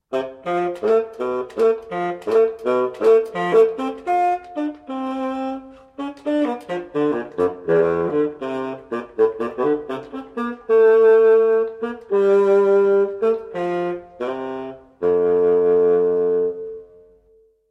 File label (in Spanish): FAGOT